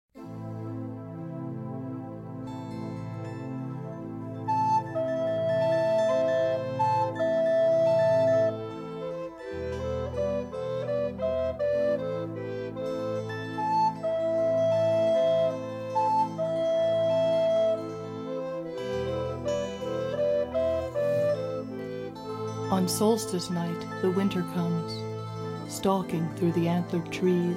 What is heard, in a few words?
Horn Dance; Abbots Bromley Horn Dance; Yule